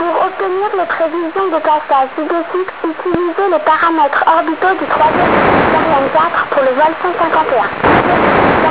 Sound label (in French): idefix frances; Sonidos del IDEFIX